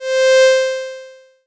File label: Lo Fi Voodoo C4, synths; Ableton Live 12 Lite; Core Library